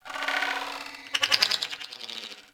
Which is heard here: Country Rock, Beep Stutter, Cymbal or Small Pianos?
Beep Stutter